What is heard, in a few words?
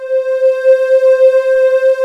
JP SQUARE PAD, ROLAND; Rubber Chicken Software Sample Bank